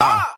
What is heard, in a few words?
ah group of men; Voice; ooh ahh